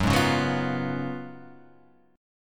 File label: F sharp Major 7th Suspended 2nd Suspended 4th F sharp; x,x,4,1,1,1,1,2; strummed